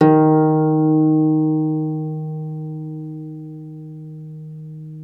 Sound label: CELTIC HA, Celtic Harp